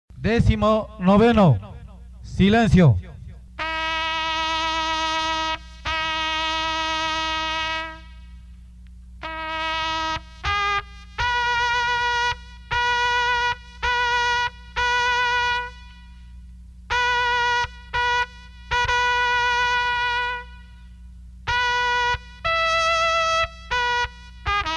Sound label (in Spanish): silencio, toques de trompeta de caballeria; himnos de caballeria